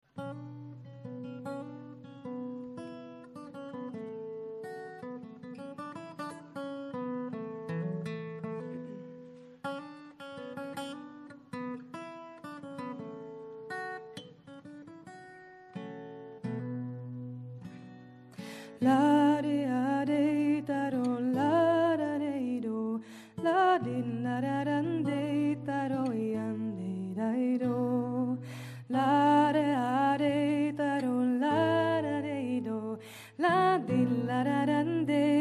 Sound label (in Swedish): Gretas Polska Kort